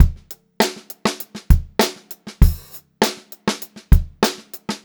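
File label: STRAIGHT BEAT, Funk-Groove Drumloops; USB Soundscan vol.38; AKAI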